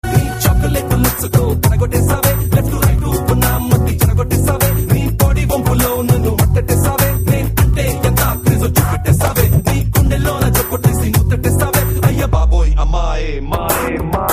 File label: rap